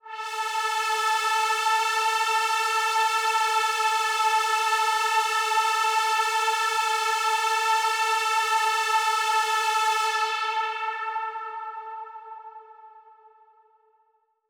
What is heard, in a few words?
Big Pad A3; KG MegaSaur